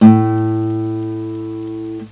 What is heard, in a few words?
tune 5 a, guitar tuner; Tom Kjeldsen